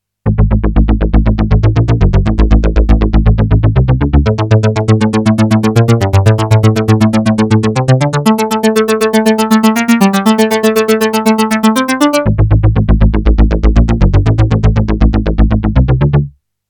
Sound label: synth